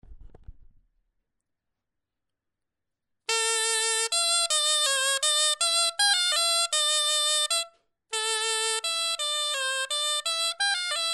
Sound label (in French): clarin etude